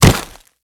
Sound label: Kubrow Step Snow, Footsteps; Warframe